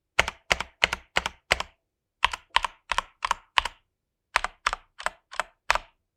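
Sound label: Function Leer Enter Back; NZXT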